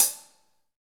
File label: HH, Kits, Rev